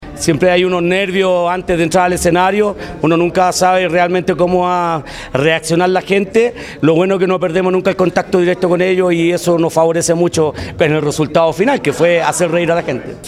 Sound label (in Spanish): Atletas Risa